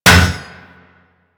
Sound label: Disrupter Aura Disabled; Warframe; Corpus Modular Crewman